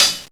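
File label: SN, snare; X-Static Goldmine 1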